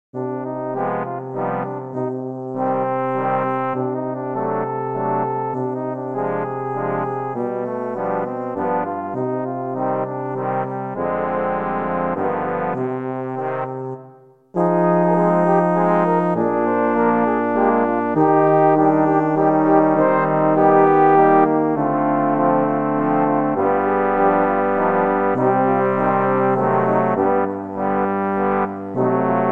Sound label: Spanish Dance